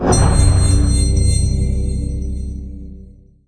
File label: purification; weapons; dota; omniknight